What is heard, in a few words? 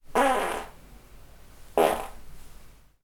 fart